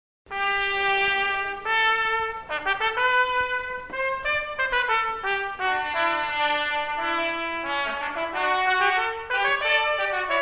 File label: fanfare; colonel